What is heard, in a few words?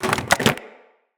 Weapon Drop; Weapons; Weapon Drops; Grineer